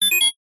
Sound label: science fiction robot beeps chirp short